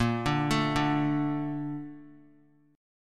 A sharp Major 7th; A#M7; arpeggiated; JGuitar

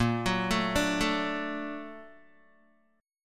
Bb Augmented Major 7th Bb down Guitar; 4E,4A,5D,5G,5C,6F; Bb+M7 arpeggiated; JGuitar

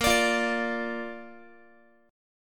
A sharp Major, Guitar; strummed; tuning 4A,4E,5A,5D,5G#,6C#

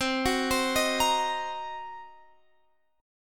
F sharp 6th Flat 5th C

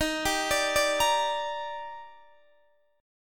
D sharp Major 7th, Guitar DADGAD

